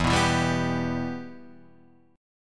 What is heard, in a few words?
B Suspended 4th Sharp 5th, E, chord